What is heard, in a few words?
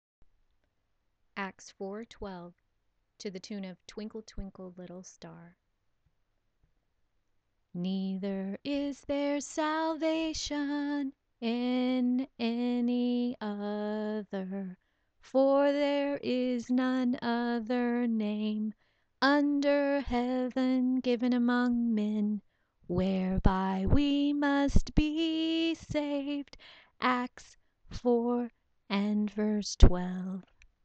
song